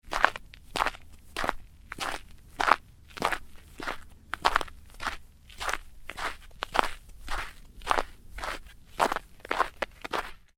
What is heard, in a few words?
Footsteps: sneakers walking on ice, hard and crunchy; Stuart Duffield